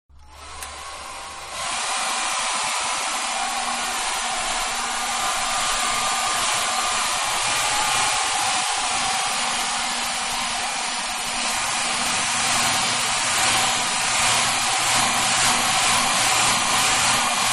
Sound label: Hair Dryer Medium; Appliances; Household; Manhattan Production Music